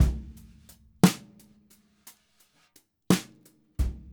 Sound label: Brushes SN HH